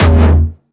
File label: Jumpstyle Kick E2; Jumpstyle Multis; vengeance essential club sounds-3; VEC3 Bassdrums